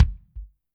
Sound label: KICK; Q Up Arts; Denny Jaeger Private Collection